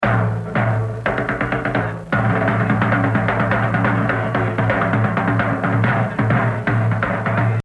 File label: Solo, Jazz Drumming; The Evolution of Jazz Drumming (Gottlieb)